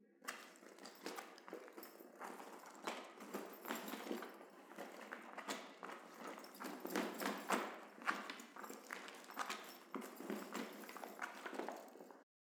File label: Rummaging Through Bag